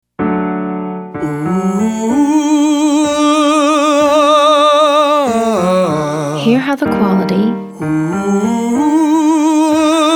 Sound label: Exercise 6 oo to uh male